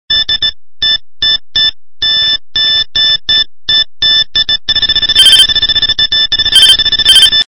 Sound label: Delkim Slow